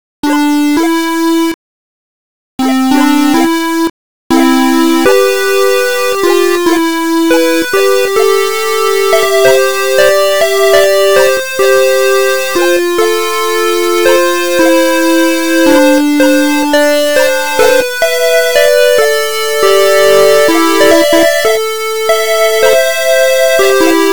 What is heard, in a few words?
gentle 8bit